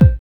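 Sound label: BD, bass drum; X-Static Goldmine 1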